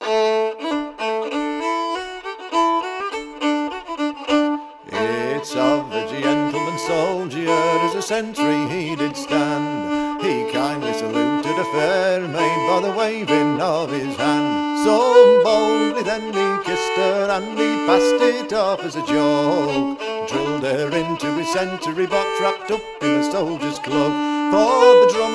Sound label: folk song